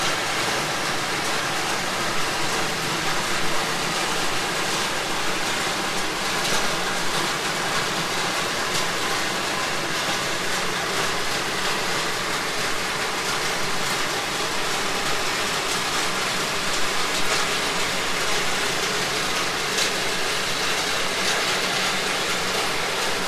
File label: freighter nearing dock